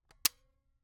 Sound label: Camera, Kodak Extramax, pocket, 110 film, plastic, metal, snapshot; Eiravaein Works; Vaeyan IV